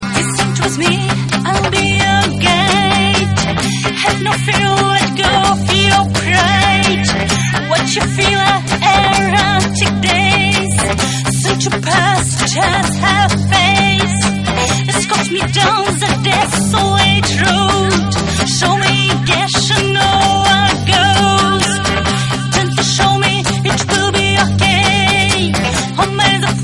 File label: Faith Pop Remix